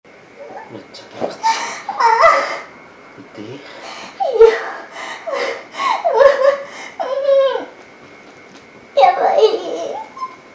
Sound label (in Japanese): やばいー フィニッシュ